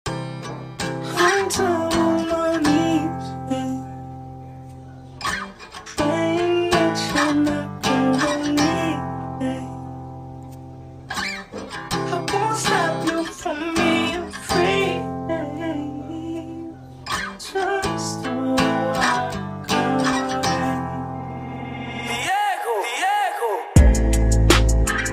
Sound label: Instrumental; By Diego Flacko